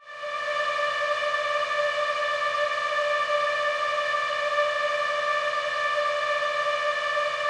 Atmos Tonal D

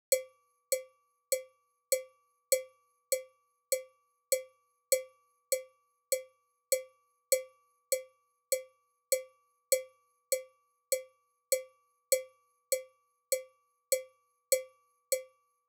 100bpm Perc

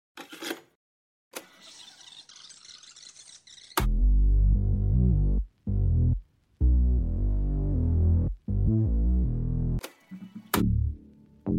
Bass Tapes; Duality

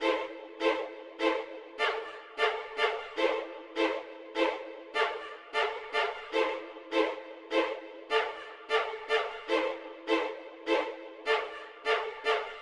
Ethnic Loop Violin 76 Emin; Ethnic Loop Vibes V2

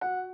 Melodic F Piano

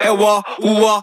vocal one shot

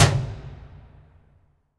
Cabinet Side Sidestick

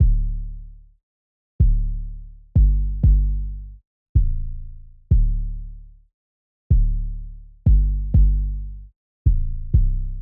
188 Dm 808

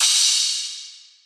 cymbal light

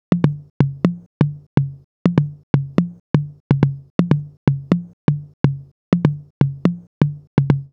Perc Loop, 124

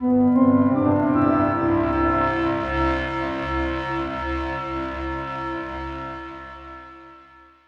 EFFECT 125 Em

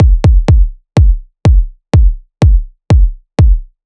deep kick 124bpm

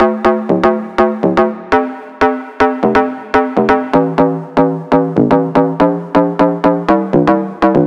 122 A Off Bass

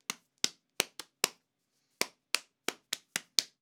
hambone roomy loop 125

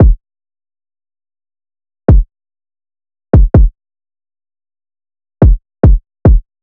Kick Loop 144bpm drill dark

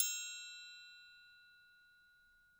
Triangle Hit Overhead

